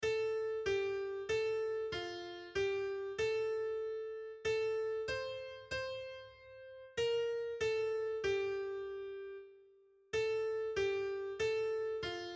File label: Ave Maria S mid; Arcadelt